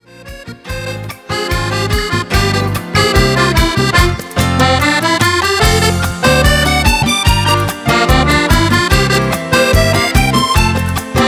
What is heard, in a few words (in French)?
extrait fantaisie rumba